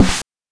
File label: BANK1 SD, NES DPCM; MIDINES